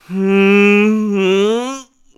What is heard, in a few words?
Cast Painting; Yuga casting a painting spell; Zelda